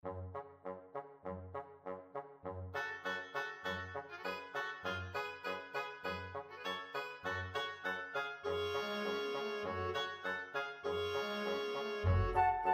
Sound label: danses des cygnets, orchestra